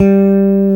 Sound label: BS 5 STR PB, Jazz Bass P. Bass; Roland LCDP02 Guitar and Bass